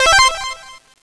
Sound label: beep